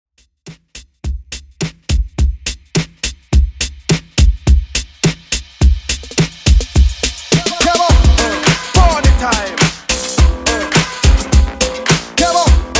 hip funky redrum 105 bpm

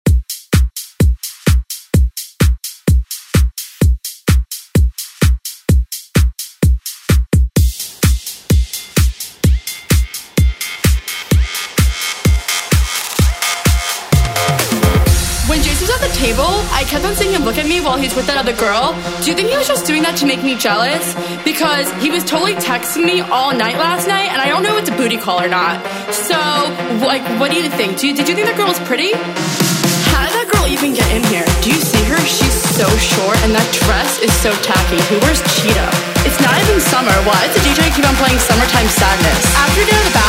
Dance Redrum; Deejay Hit

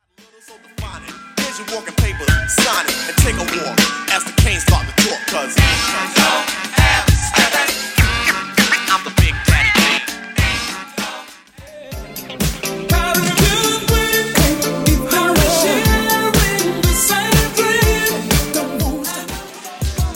80s Redrum; 10 Edits Packs

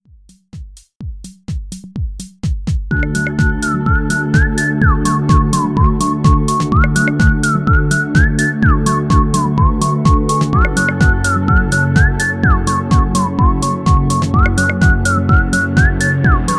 Dubstep Trap Redrum; Remixfreakz

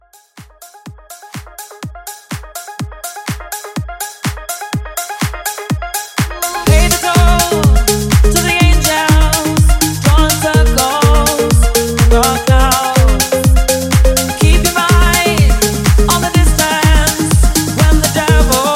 Mashup

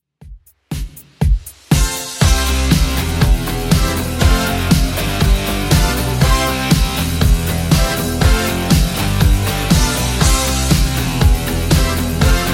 80s Redrum 120bpm

